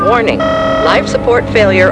life support failure, sirens; alarms

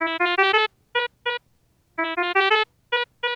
Warning, alarms

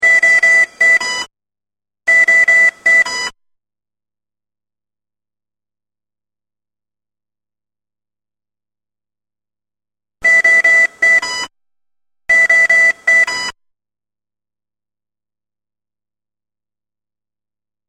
Drager ISO High, medical alarms

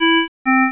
genlow, alarms

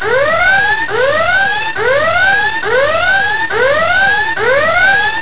klaxon, sirens; alarms

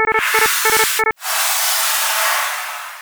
Ventilation HP, alarms; J Edworthy 2019

